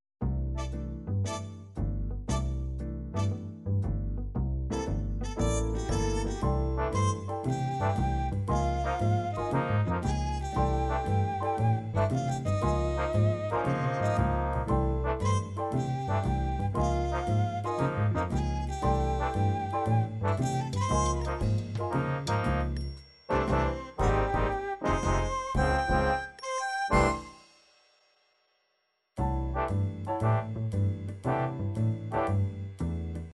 swing, midi

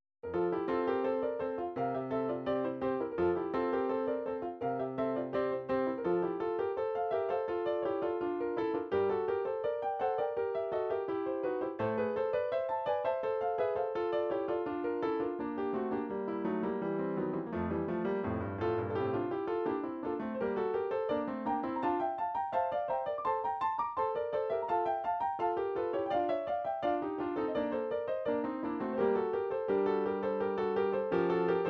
studies for piano, midi

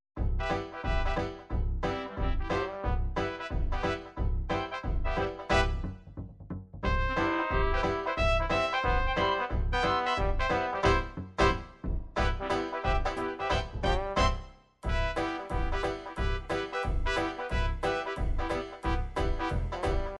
jazz dixieland, midi

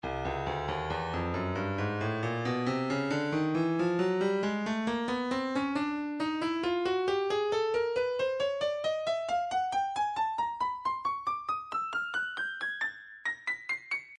breakbeat 068 bpm; Stylus Breakbeat Midi Files; candygram

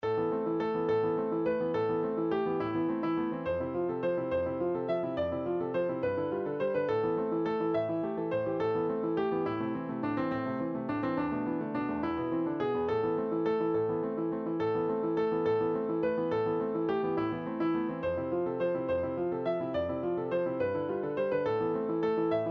piano

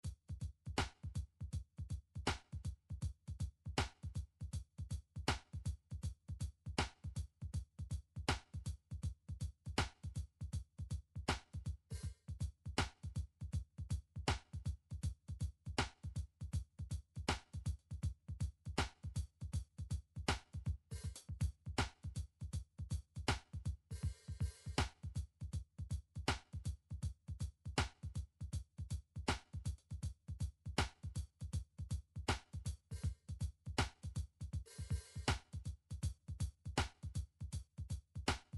midi drums

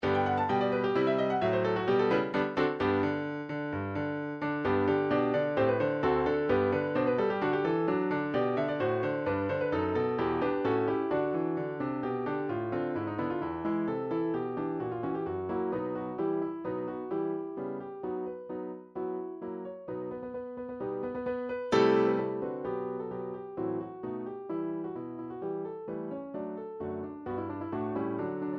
german song, midi

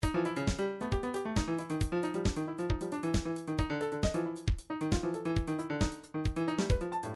trance midi loops beats sfx; Newtronic Trance Constuction Kit

